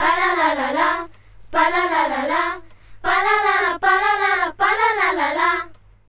vocal loop children's choir

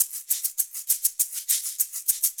shaker loop

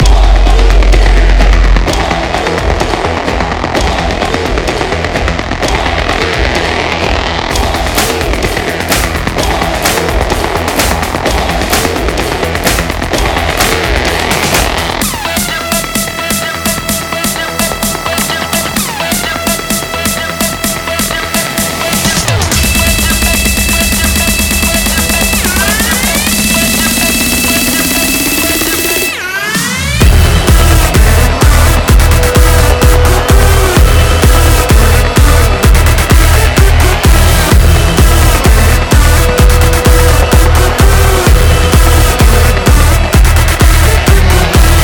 arp em

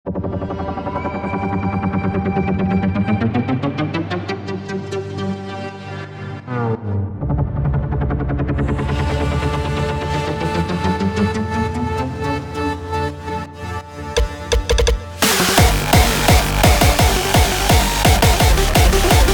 hardstyle

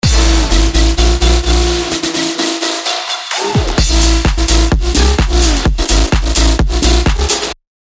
bass house

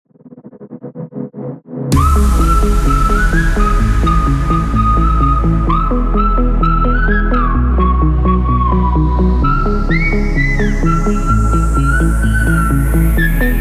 unisynth beat battle entry

